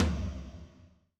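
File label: Floor Big, Alesis SR16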